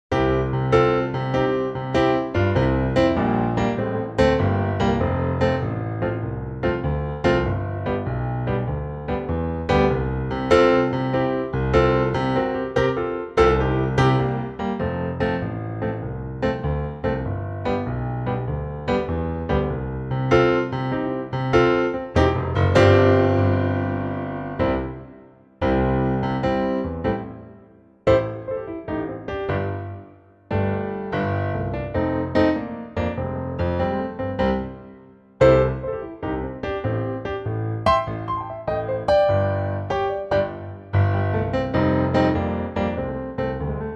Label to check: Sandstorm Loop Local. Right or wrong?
Wrong